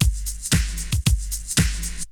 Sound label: Lo-Fi, Essential beats